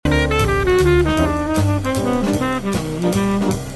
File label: bebop language